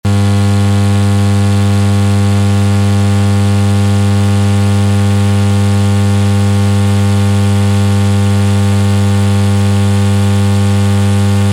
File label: Sound Fluorescent lamp